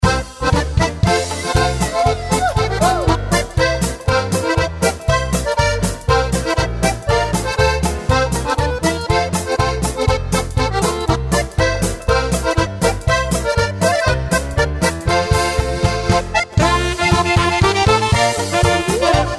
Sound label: Polka